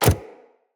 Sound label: companion step default, footsteps; Pets; Zanuka